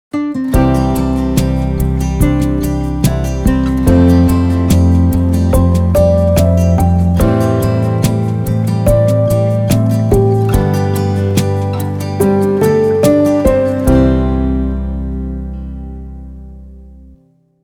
Theme Emotion; Radio Horeb

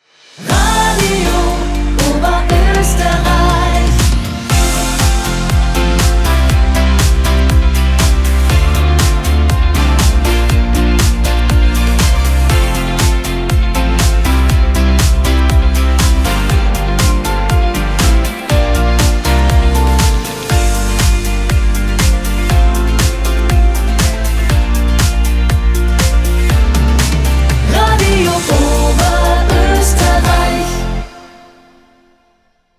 Morning Opener; Radio Oberoesterreich

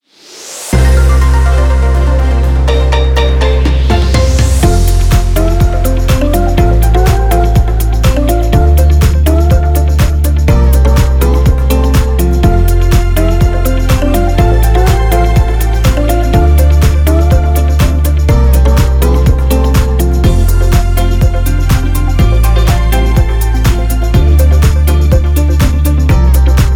Sunny

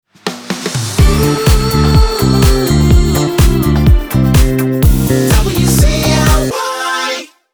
125bpm no Slogan; jinglepacks

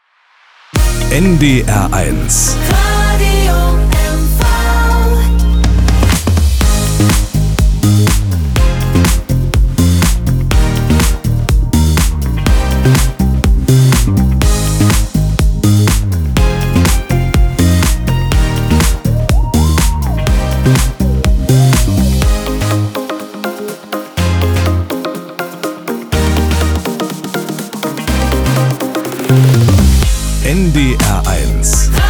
Morning Opener; NDR 1 Radio MV

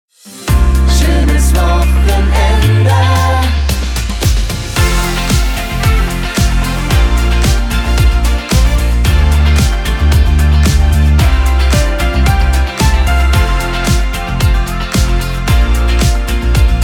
Weekend Theme; Radio Oberoesterreich

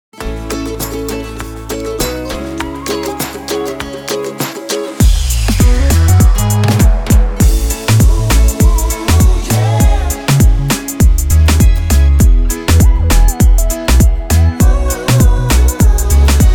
Island Theme; Das Inselradio Mallorca

